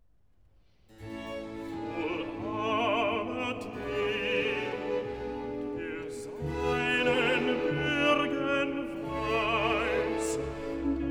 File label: Recitative (bass); Johann Sebastian Bach; BWV 105; Wohl aber dem, der seinen Bürgen weiss; Cantatas for the 9th Sunday after trinity